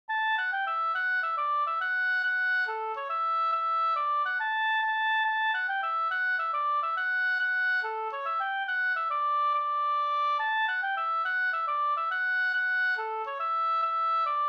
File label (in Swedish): mazurka; e Sigbjorn Osa no